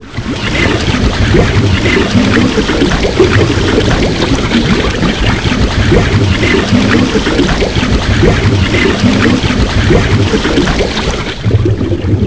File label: ship sink; shipcharge; Pirates Of The Caribbean